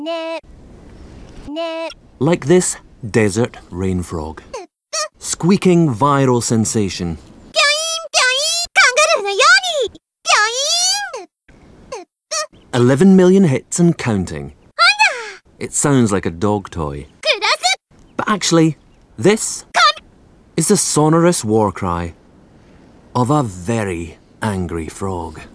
angry

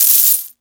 cash pickup

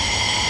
oxygen

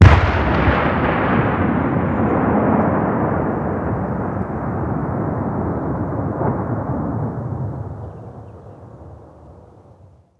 mortar hit

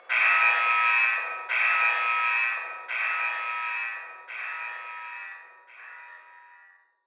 dome alarm distant

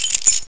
woop

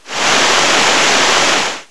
water on

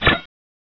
Knife Attack

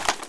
desert eagle mag, weapons

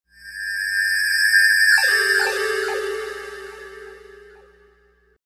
Alien Com

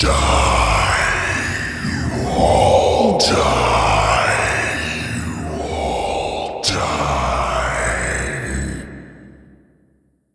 die; nihilanth

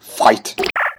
fight

